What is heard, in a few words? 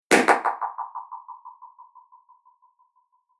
Bang Sound With Delay; Bang Sound Effects; Impact / Explosion Sound Effect